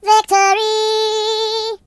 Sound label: Victory, Singer; hedgewars